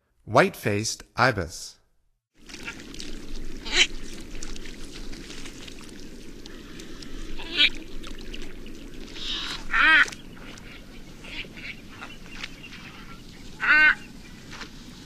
White faced Ibis; North America; Stokes

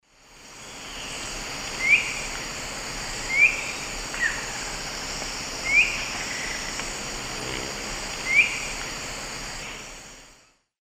Zimmerius gracilipes, call; S. America; Eastern Lowlands

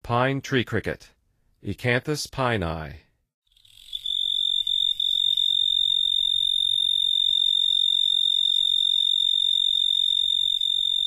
Pine Tree Cricket

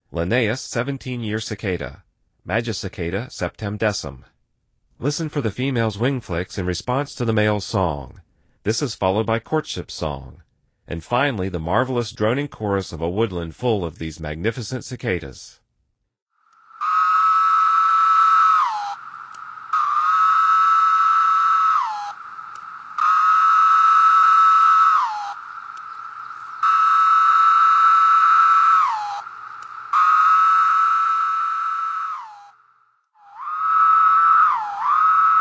Linnaeuss 17-year Cicada, Songs of Insects; N. America

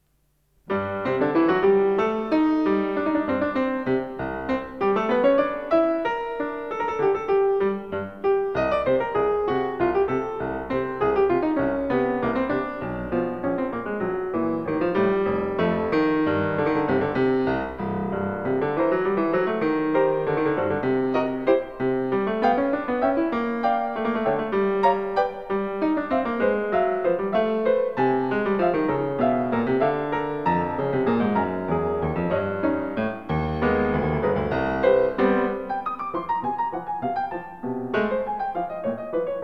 Mikrokosmos, Piano; Bartók; Sz. 107 Book 5 No.130 Village Joke

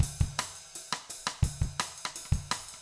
jungle 170

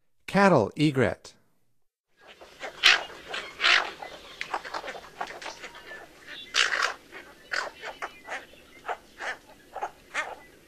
Cattle Egret; North America; Stokes

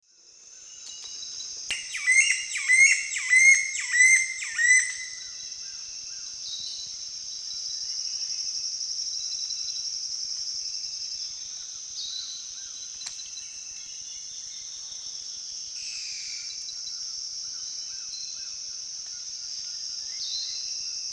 Hylexetastes stresemanni, song; S. America; Eastern Lowlands

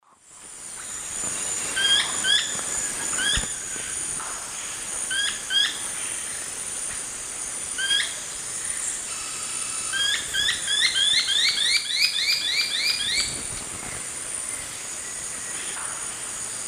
Cnipodectes subbrunneus, calls; S. America; Eastern Lowlands

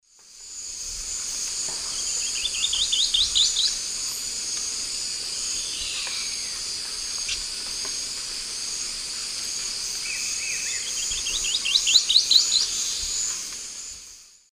Glyphorynchus spirurus, song; S. America; Eastern Lowlands

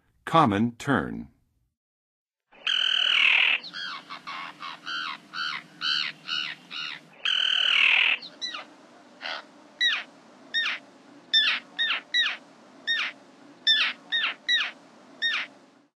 Common Tern, Birds of North America; Western USA; Western USA Songbirds

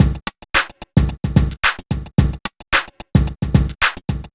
r n b kit 110

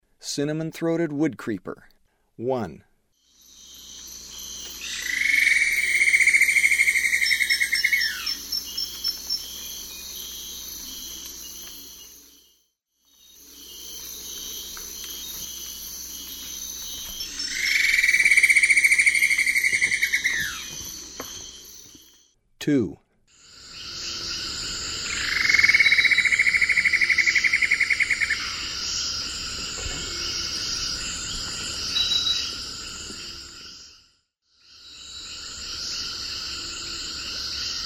Cinnamon-throated Woodcreeper; S. America; Eastern Lowlands

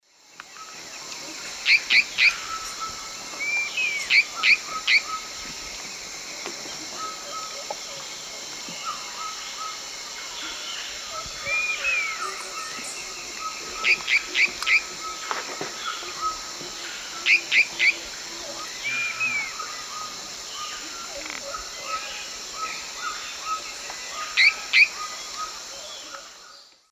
Tyranneutes stolzmanni, call; S. America; Eastern Lowlands

